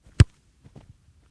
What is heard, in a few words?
bonus Game Shoot, football